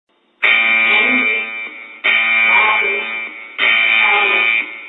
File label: Rescue Tone